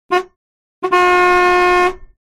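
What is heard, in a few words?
Car horn sound, klaxon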